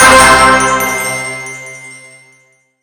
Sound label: Finish Level; Futuregrind